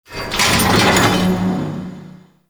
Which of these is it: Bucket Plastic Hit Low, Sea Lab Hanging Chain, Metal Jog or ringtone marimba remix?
Sea Lab Hanging Chain